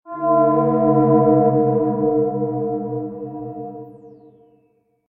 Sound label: Painting Sound